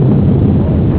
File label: boom